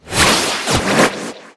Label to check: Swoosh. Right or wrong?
Right